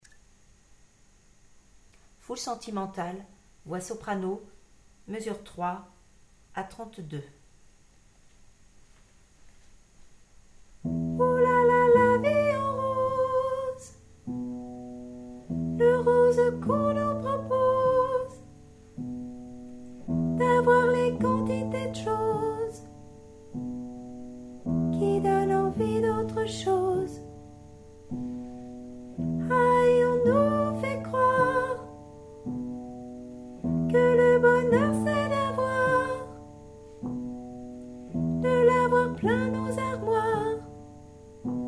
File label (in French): soprano